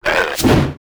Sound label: attack; SNATCHERREX; Mob